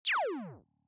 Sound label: Lazer Sound